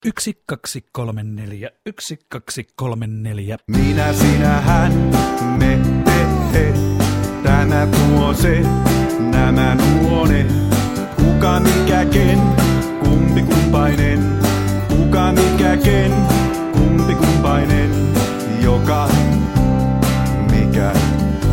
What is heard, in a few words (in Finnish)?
rummuilla; alkulasku; pronominit